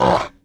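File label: dragon hurt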